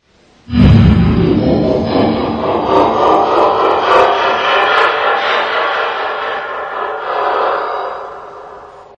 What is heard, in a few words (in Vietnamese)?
cuoi rung ron kinh di